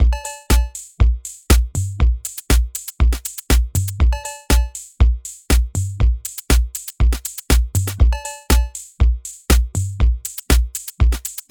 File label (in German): Analoger Drumcomputer